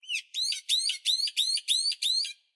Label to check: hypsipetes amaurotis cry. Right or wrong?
Right